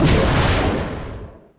missile fire; weapon

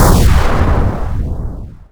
rapid rocket fire; weapon; missile

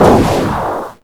missile launch high; weapon

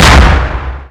flak hit; weapon

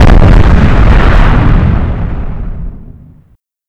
heavy missile launch; weapon

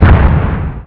arty hit, cannon; weapon

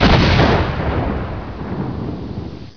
sabot fire, weapon missile

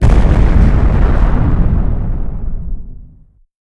icbm launch, missile; weapon

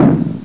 rocket hit; weapon; missile

